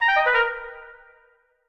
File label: Whisper; king s quest chapter 1 a knight to remember gamerip